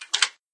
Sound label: Lock